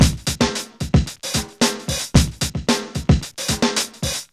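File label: 112 bpm, Funky loops; Zero-G; Funky Elements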